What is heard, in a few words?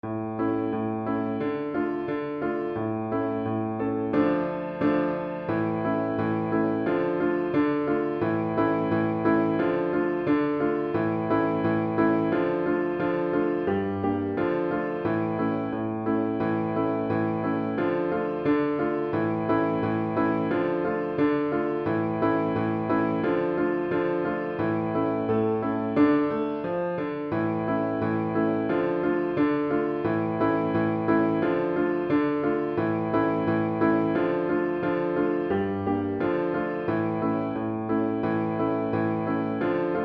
russian dance accomp